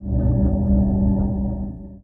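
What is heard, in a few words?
spaceport ambience; Stellaris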